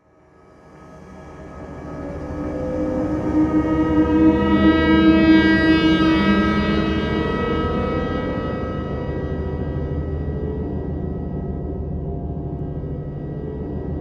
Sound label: horrific horror moment; sonniss gdc